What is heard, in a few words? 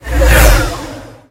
Space world Pass by car; Talking Tom Gold Run